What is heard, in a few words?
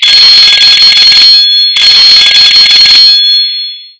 Loud Ring